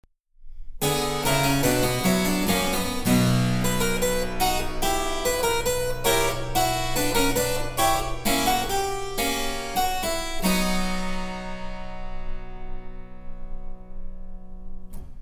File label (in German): Cembalo; Mark Lindley WTC 1